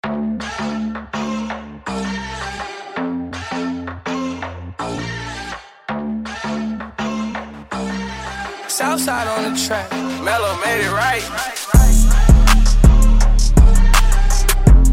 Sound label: INST, 82BPM G